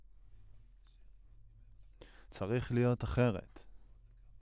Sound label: Voc OD